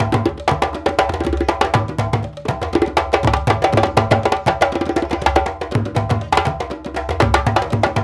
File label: African Contemporary, Lamba, 120; Spectrasonics; Supreme Beats